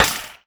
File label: splat hit